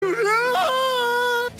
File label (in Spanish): llanto